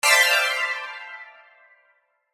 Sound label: Hit Sparkle Burst Flange, Hit Lingering Impact; Production Element Imaging Element Accent Transition; Sparkles; Blastwave